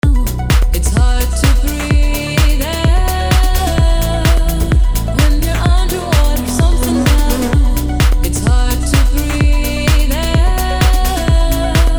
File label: VC Breathe; Vengeance Sound Avenger 2; Melodic Tech Voices 1